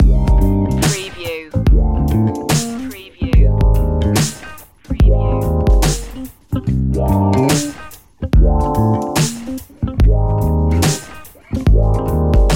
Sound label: freshest beatz loop